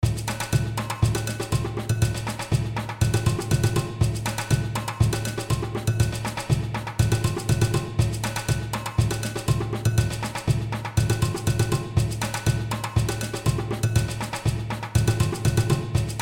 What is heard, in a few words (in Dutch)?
ritme